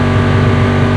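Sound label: Engines medium loop; SuperBike2001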